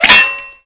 impact metal; Modern Warfare

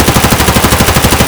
aps indoor close loop; Escape from Tarkov

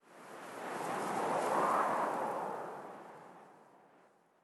ambient battle; World Of Warships

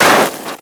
foot snow; Call of Duty Modern Warfare

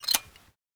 double shotgun open barrel; Rust

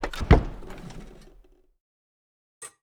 Aircrafts L-39 Canopy Open; DCS

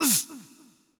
player grunt

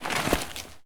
prone concrete; Escape from Tarkov

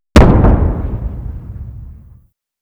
Vehicle Weapons; Red Orchestra Ostfront 41-45

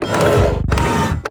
biomech look around

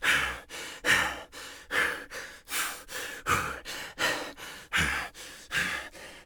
bear2 breath sprint; Escape from Tarkov

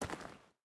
footstep boots stones walk; Rust

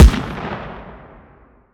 combat atmosphere; World of Tanks

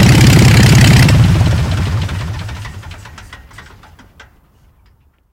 zlinn stop, engines; War Thunder

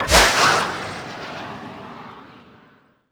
turret aa launch

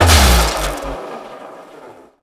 small generator off

